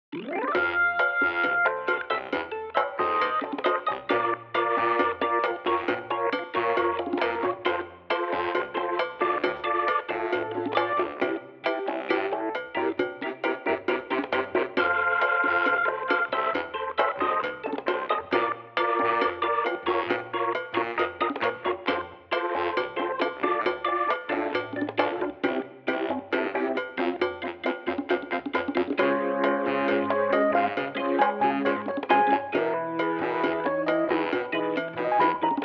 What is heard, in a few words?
samba less drums